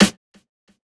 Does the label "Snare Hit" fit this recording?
Yes